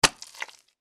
Impact Mud Hit Moist Surface; Impact Mud Hit Moist Surface Suction; Low Impacts; Sound Effects:Impacts; Blastwave

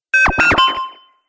Droid Agree Bleeps; Robot Sound Effects; Sci-Fi Robot Sfx; Andrew Fleming

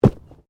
Body movement Fall Heavy

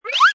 Cartoon Slide Whistle Wind Up; Comedy/Cartoon SLIDE WHISTLE, WIND UP: Classic cartoon slip and trip; Lynne Music

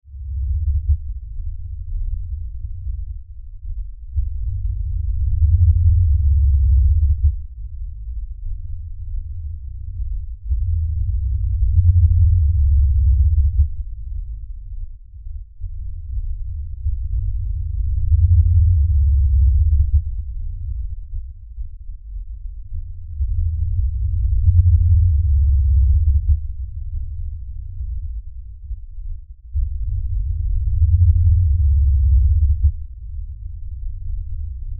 Lfe Drone Low Bass Rumble; Drones; Blastwave; Sound Effects:Sound Design

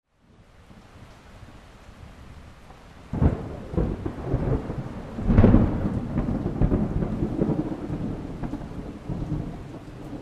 Thunder Cracks Light Rain; Weather; Thunder Sound Effects; Part of Thunder (Sound Effects:Weather); Blastwave

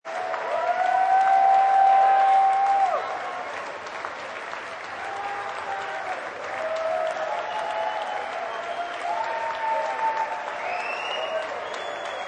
Rock concert audience, postshow, large; Concerts

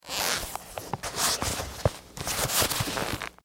Chair Human Move, Chair Human Squirm Settle; Foley; Chairs; Offices; Blastwave

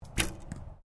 ARCHERY BEGINERS BOW FIRING; Various archery sounds; Miscellaneous Sports

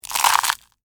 Crunch Sugar Cone, Soft, Waffle; Texture; Crunches; BlastWave

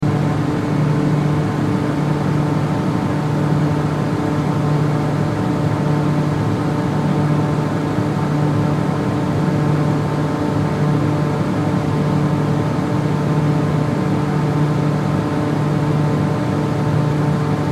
Factory Generator, Factory Generators Loop; Factories; Backgrounds; Industry; Sound Effects:Backgrounds; Blastwave

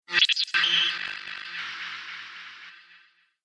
Alien Communications; Alien Sound Effects

